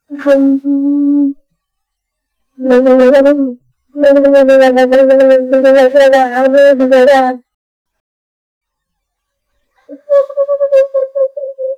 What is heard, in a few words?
funny bedsheet ghost woma